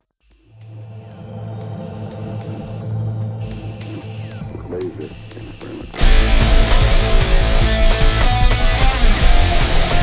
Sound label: Techno